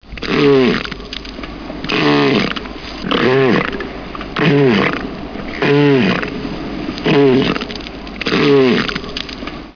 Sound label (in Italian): elefmar